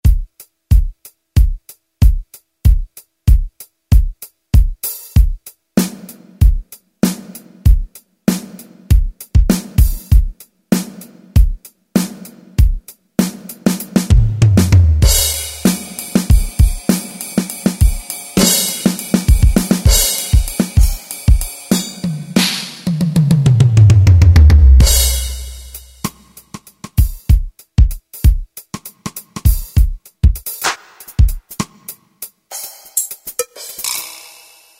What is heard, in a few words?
Std. Kit 1; Yamaha YPT-400; Voices